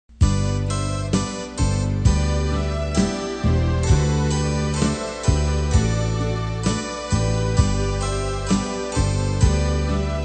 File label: ALTO